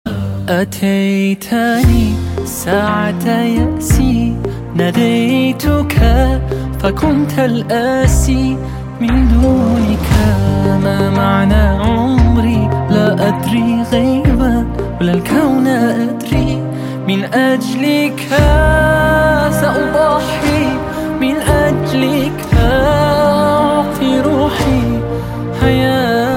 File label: Rington Arabic; Sad